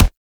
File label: Weapon Punch Rifle; Warframe; Weapons; Rifles